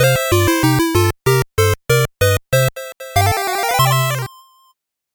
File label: 8bit jingle slow